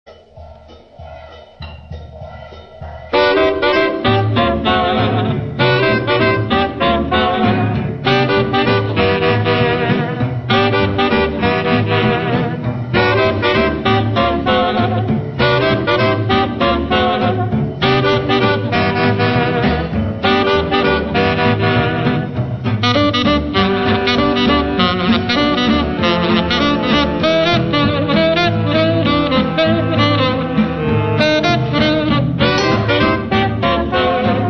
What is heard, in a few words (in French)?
Trio De Saxophones; Reflets